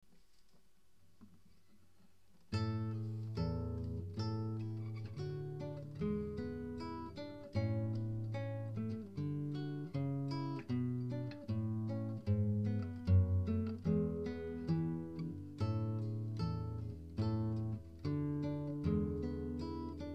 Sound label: gavotta